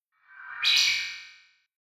Space SciFi Robot Digital Swish Spaceship Transformer; Sound Design FX; Robot Sound Effects